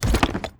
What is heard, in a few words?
ui box open